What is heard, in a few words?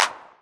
909 Clap, Novation Drumstation